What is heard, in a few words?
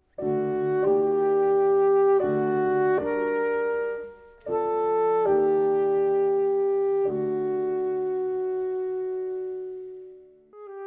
Motor